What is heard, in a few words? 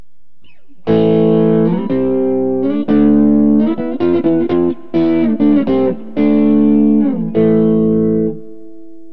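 sdcs gold neck, guitar pickup; burny; fugly clips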